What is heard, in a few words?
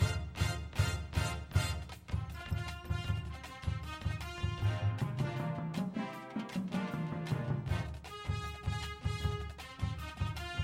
basses w music